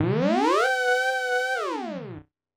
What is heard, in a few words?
Teleport, Super Nintendo; Legend of Zelda, The - A Link to the Past